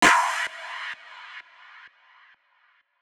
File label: Fx Clap, techno drum fx; Single Hits